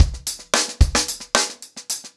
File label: Hip Hop 110BPM, acoustic drums drumloops; The Roots Drumloops; Hail Hail Hip Hop